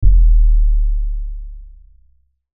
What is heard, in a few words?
HIT BASS DROP; HITS